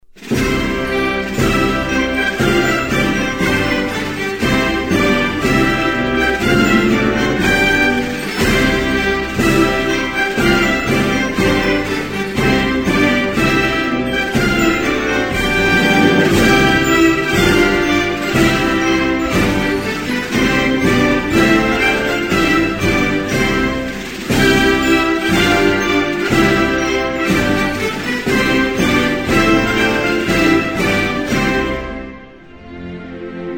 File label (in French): Espagne, Hymnes nationaux